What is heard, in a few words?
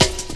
Lym Cut, Jungle, Drum Loops 165.5; Zero-G Jungle Warfare 1, 2 & 3